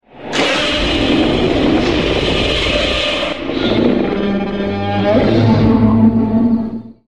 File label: godzilla roar; 2014 godzilla